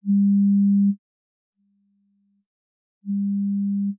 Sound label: antiphase, headphone check stimuli